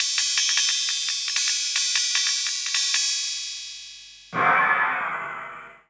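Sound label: COO percussion, drum loop; Big Fish Audio; Uncivilised G'rooves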